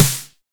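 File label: 909 SNARE; Drums In Your FACE!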